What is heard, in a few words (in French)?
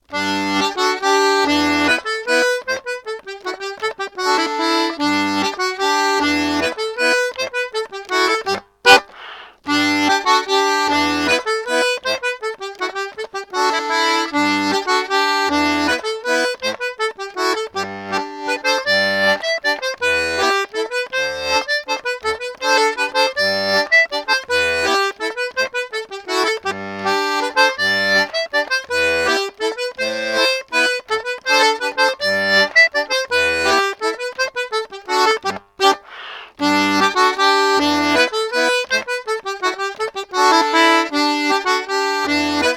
Mazurka